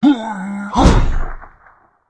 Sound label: ogre attack, monster